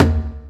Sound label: Drum; Talking Tom Gold Run